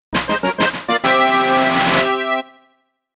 Course Clear; Super Mario 64 Remastered soundtrack

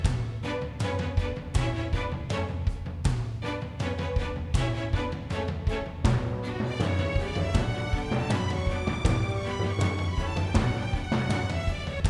Boss 160 Med; Plants vs. Zombies Battle for Neighborville; Dreadwood

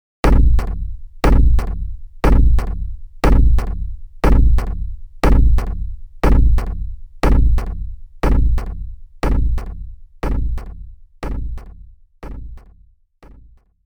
Heartbeat; Shiro Tokisada Amakusa 1

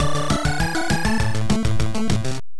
Stage Clear, Game Over; ultimate stuntman nes

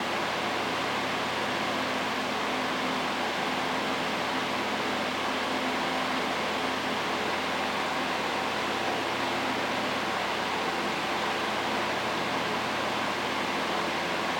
fan High

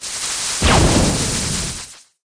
All bullets fired

